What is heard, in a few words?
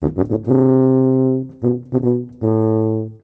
tuba toot; Musical Instruments